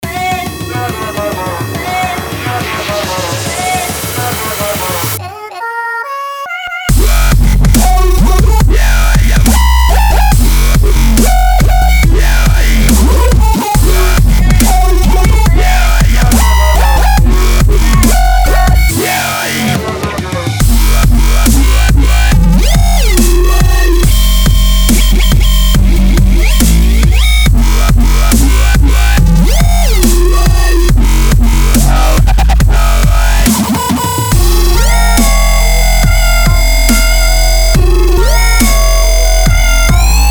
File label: Ultimate Dubstep